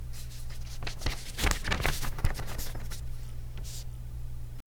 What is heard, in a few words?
rustle the paper shifts